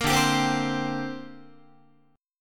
D 7th Suspended 4th Sharp 5th down Guitar; D7sus4#5 strummed

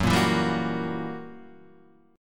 Gb 7th Flat 9th Gb Guitar Open D

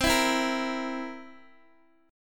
C 7th C down Guitar DADGAD

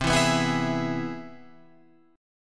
C Augmented Major 7th, Guitar, Open F; strummed; JGuitar

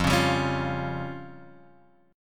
F Suspended 2nd Sharp 5th F down Guitar Drop D

